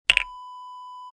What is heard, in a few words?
bottle cap set down